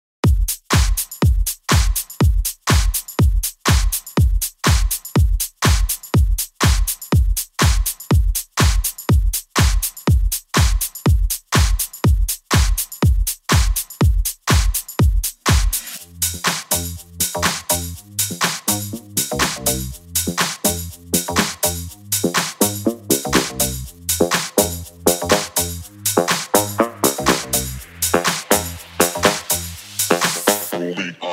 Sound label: sax build up; AWESOME sax sfc build up; Peyruis Audio Library; Outdoor Raves; jungle clubs